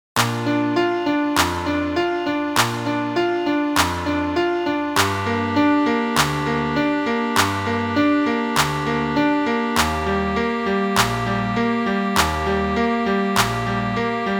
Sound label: on the beat